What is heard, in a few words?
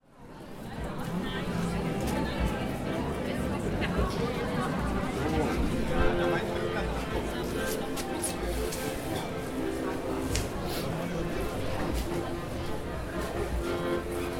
weihnachtsmarkt univplatz accordionist